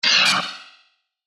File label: Static Burst Delay, Static, Burst, Stutter; Production Element, Imaging Element; Accent, Transition; Static Sound Effects; BlastWave